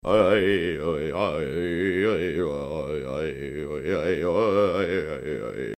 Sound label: chant